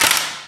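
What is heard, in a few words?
physics clipboard impact small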